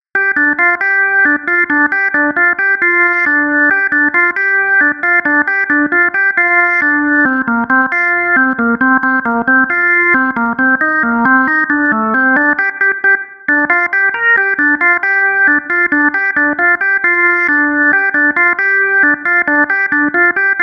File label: organ; HALion6 : Model C; Drawbar Chello